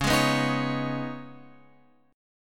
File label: Db 7th Suspended 2nd, Guitar, Open F, Db7sus2 chord; JGuitar